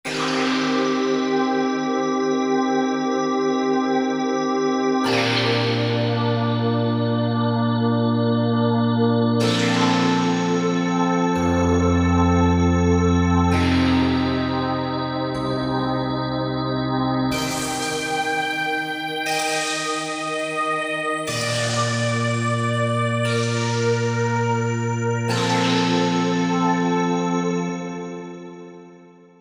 In Space, Sound Effects; Korg MSC-14S Sound Effects

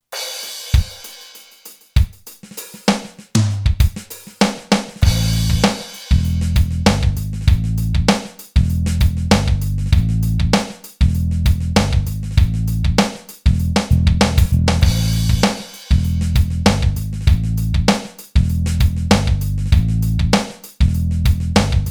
ROCK SWING, Boss DR-3

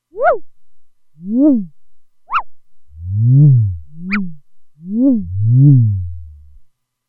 Wooperwill; Factory 2

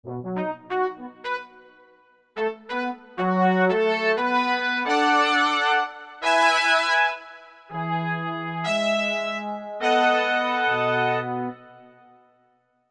Trpt & Brass, Korg MSC-11S Brass

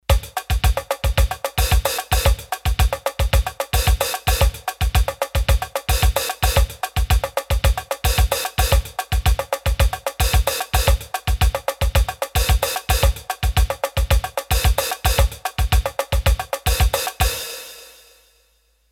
Samba

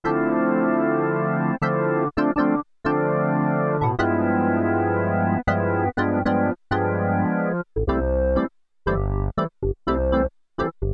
ORGAN, SIEL DK 80; Factory

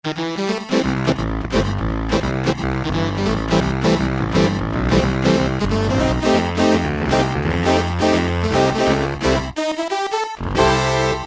Saxcessive Tones, Roland PN-D50-03

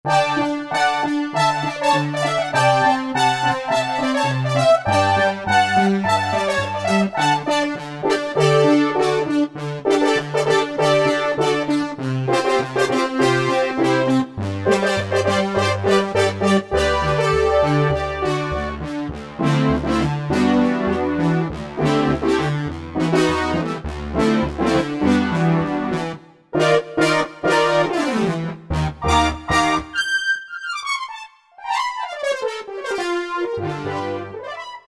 Syn Brass 880, Roland SC-880; Factory Patches

